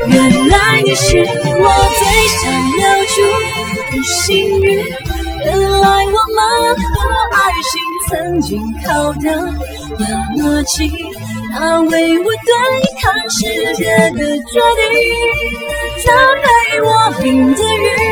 separated singing

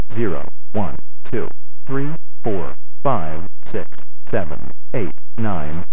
quantized filtered, Vref DAC; PIC32

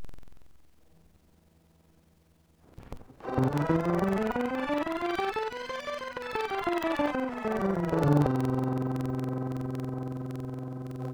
C scale distort

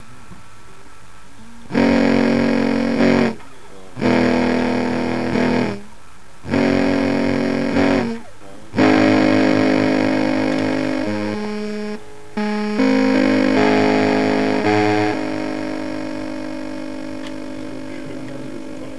Distortion

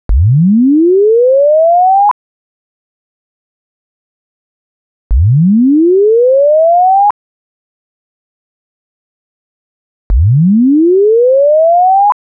Freq Ramp

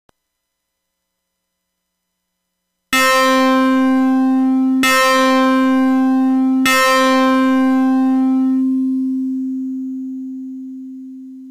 string like, Sound synth; PIC32

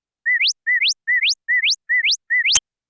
Bird; birdsong

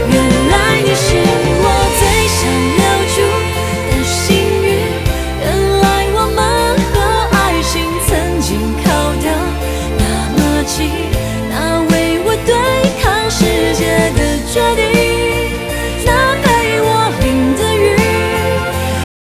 song

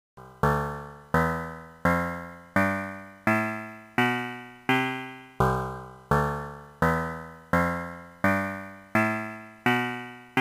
String width octave; DE1 SOC; DSP; Synthesis

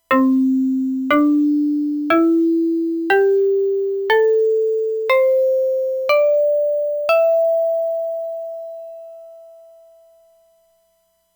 plucked string, FM examples; PIC32; Sound synth

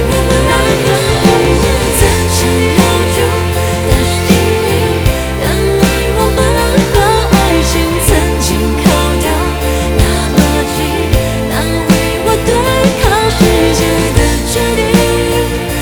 separated music

